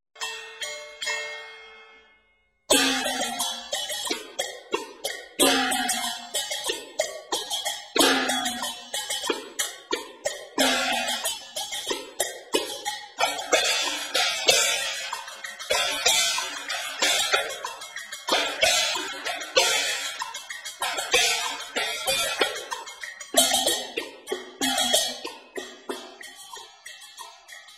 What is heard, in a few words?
woodblock; chinese